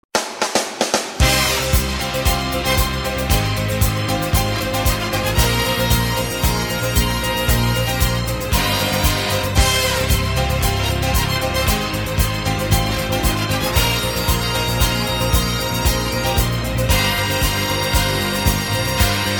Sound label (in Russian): Типа фанфары